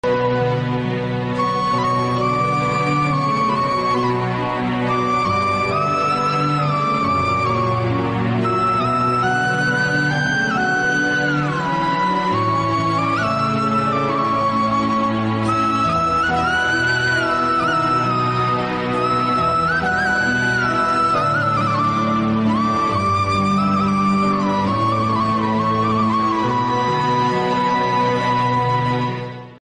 mahabharata flute

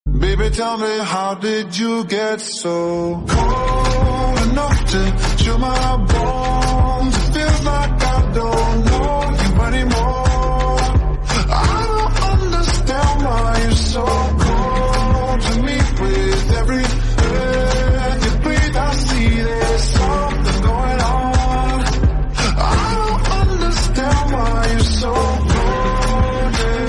english song

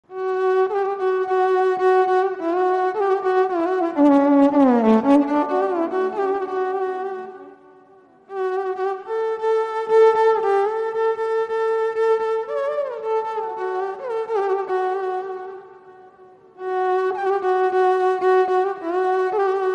horror instrumental bgm

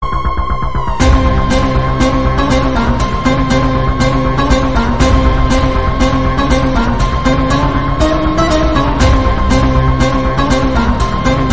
mass bgm; kgf

